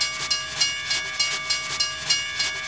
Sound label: new train; The Interactive Alphabet